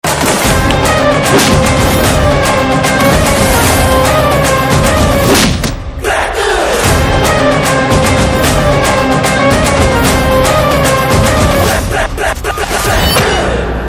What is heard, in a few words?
Theme BGM Ringtone; Krack